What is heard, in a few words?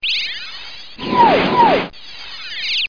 MORPH AND ROCKET; DR BRAIN; BUGS